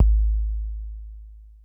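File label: analog kick; 90 sSampleCDs; Crypton - DrumBase5000; ANALOG KITS1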